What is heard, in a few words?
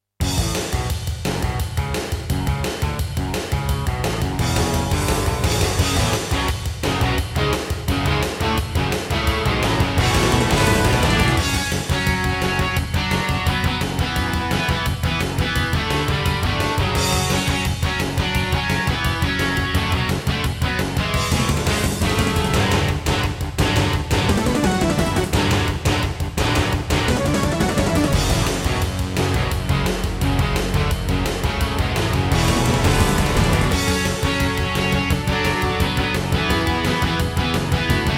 Intro, Roland SC-88; Duke Nukem 3D